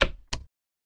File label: switch switch